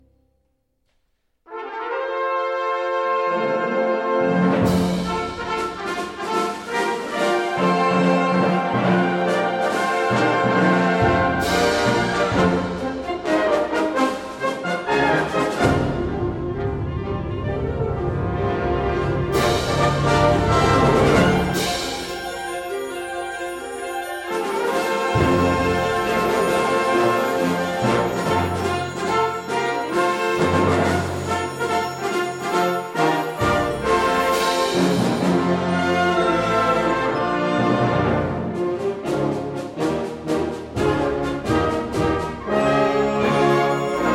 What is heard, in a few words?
Fanfare